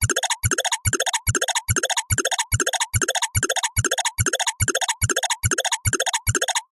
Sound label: science fiction console alarm